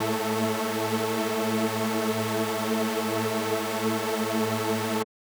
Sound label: SuperSaw A4; KG MegaSaur; M8